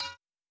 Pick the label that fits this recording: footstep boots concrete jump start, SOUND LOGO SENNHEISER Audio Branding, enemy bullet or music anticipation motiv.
enemy bullet